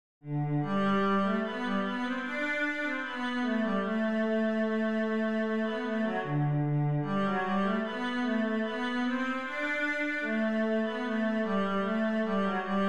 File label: simple gifts cello